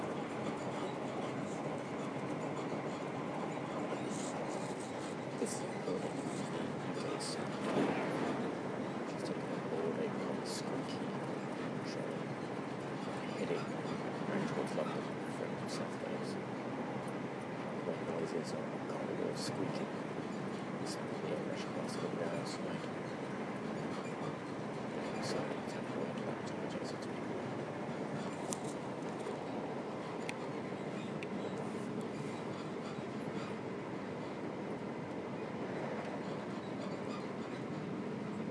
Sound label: squeaky corridor on train